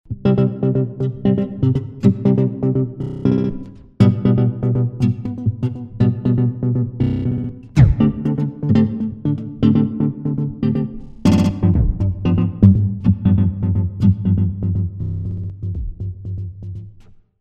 EFX Motions Gate Keeper

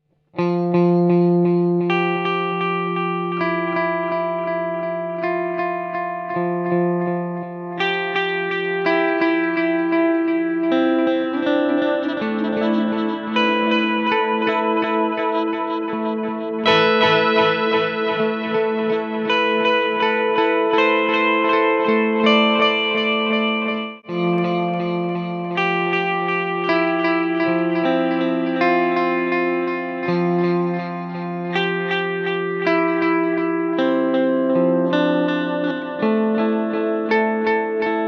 12 bit

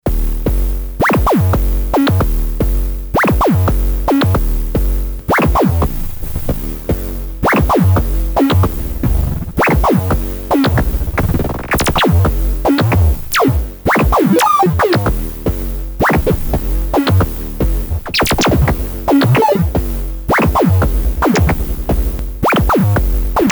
MIDI Controller XY; Workshop Korg Kaoss Replay

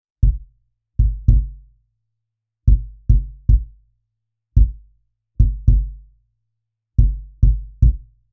Cajon modern

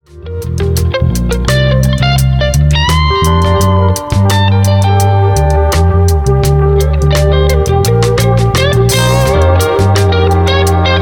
Elevated Depth Presence; Waves Online Mastering Service

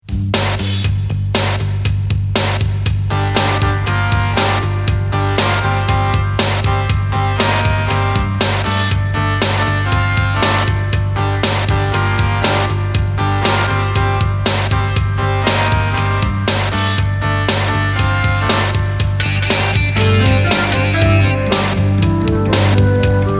Behringer 646 paraphonic fuzz

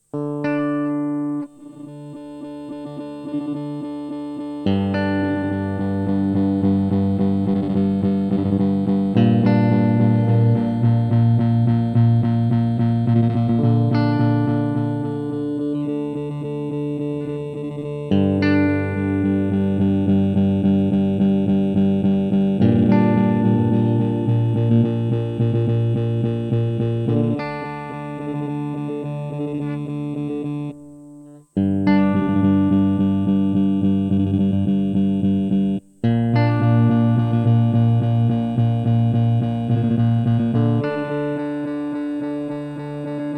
Glitch Size Error Bitcrush; Chase Bliss Onward

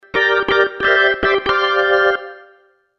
Organ Warm Funk

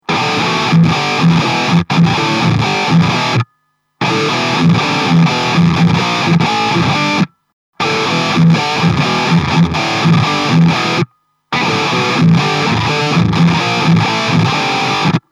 Fortin Zuul Blackout Distortion Tight

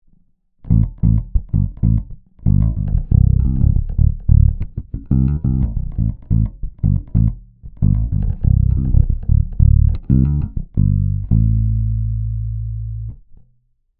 Ibanez EHB1505 Neck Bridge low boost

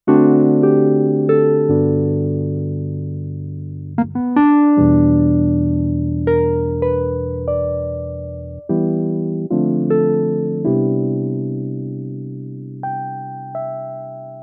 Yamaha CK88 Wurlitzer Warm

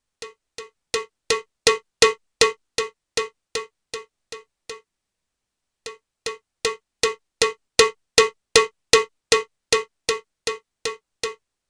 Cow Bell

